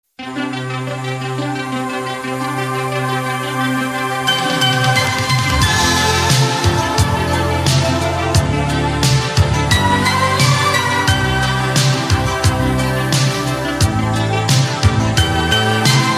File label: love songs